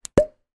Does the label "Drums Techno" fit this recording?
No